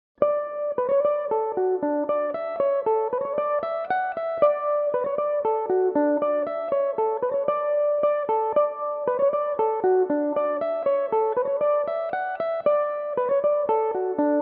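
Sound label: D major, Irish mandolin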